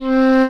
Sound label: Flute C4